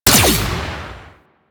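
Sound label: Igniter Pistol Projectile Explosion; Warframe; Weapons; Corpus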